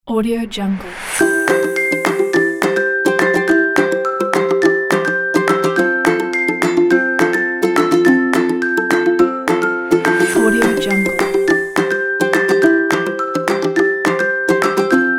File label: children cooking